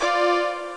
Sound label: VIOLA